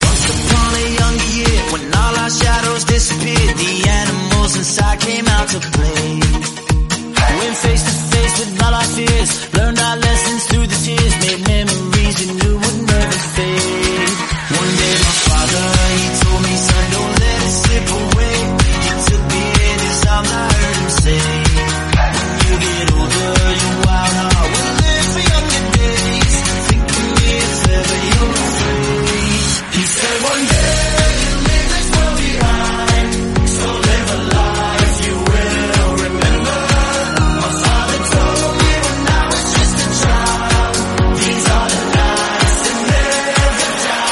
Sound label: 126bpm F; Fifa 2015; REDRUM